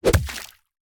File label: punch